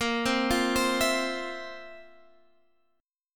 Bb Suspended 2nd Sharp 11th, chord; Bbsus2#11; arpeggiated; JGuitar

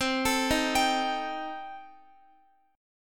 C Minor 6th Guitar, chord; Cm6 arpeggiated; x,x,12,14,15,15

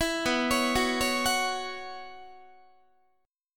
B Suspended 2nd Suspended 4th B Guitar, chord; arpeggiated; JGuitar

